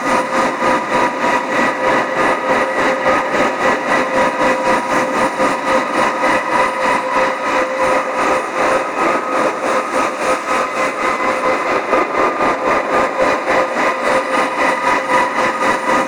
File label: Archwing Healer Engine Loop Idle, Vehicles; Warframe